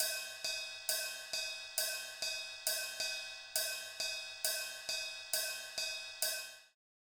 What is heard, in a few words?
Beat - Rides, Grime, 135bpm; Zero-G Sound Sense Grime Scene; Construction Kits; A Positive Vibe